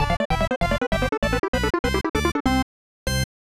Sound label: Lose Life; Cool Spot; SNES